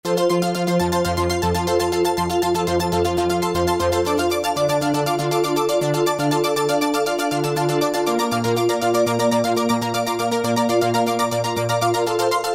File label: Sweet Chords, synth